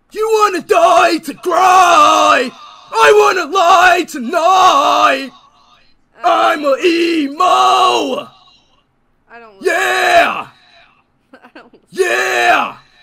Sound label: Emo Song; ishowspeed